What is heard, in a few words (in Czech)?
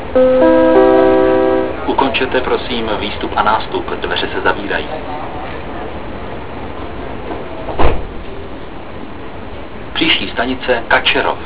odjezd, metro; Ukončete, prosím, výstup a nástup, dveře se zavírají. Příští stanice Kačerov